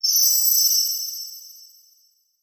Production Element Title Transition Crystal Element